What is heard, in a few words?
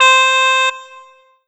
Roland SC-88 Shanai C5